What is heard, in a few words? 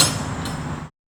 Metal Box, percussion; Navigation; Houston